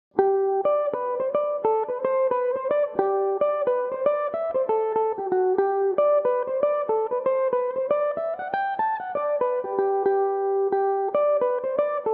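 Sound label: g major, mandolin; The Child Of Summer; Belmuse EM-190 electric mandolin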